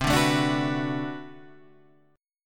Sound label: B Minor 7th C, chord